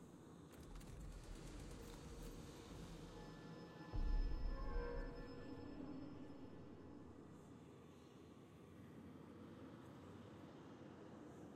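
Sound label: ambience demacia weapon vault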